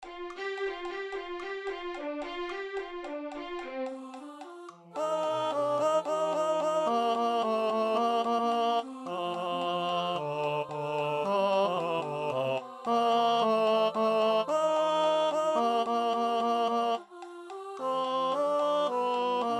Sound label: Tenor